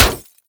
manhack, bullet impacts; physics; Half Life Model Pack

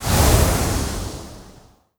dash start, player, dash; Half Life Alyx

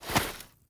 snowball pickup; winter; Counter Strike Model Pack; CS:GO

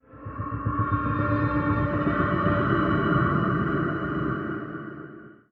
amb prepper rhythmic, ambient; Half Life Model Pack

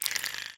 slobber, clams; infestation

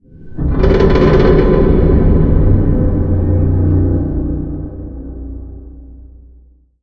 warehouse ambience, industrial; ambient; Portal 2

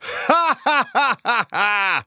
taunt haha; Counter Strike; CS:GO; taunts

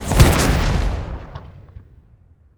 missile land; Counter Strike Model Pack; CS:GO; survival

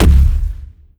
bass heavy, zombie blind step; Half Life Alyx; creatures

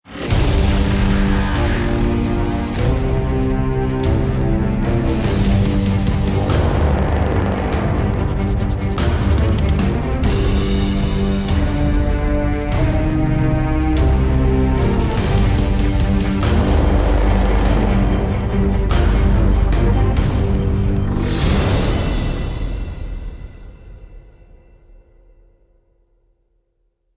start round, music; Counter Strike; CS2

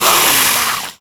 attack hiss, headcrab, creatures; headcrab classic; vox

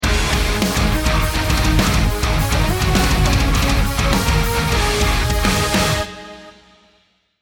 round mvp anthem, music

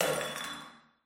glass break; ambient; amb oneshot

